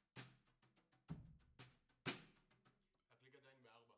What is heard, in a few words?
Overheads, 96bpm